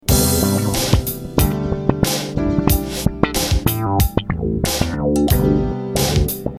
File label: space bass